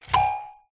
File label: click